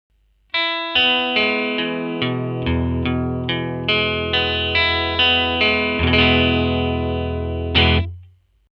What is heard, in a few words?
jag neck, vintage and reissue jaguars; sound samples of vintage and reissue jaguars and jazzmasters